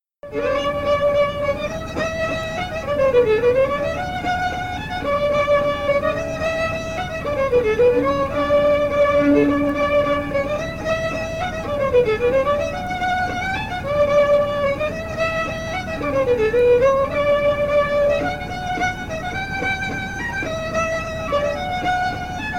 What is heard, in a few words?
hornpipe fiddle; leslies hornpipe; tune tutor; SeamusGrantFestival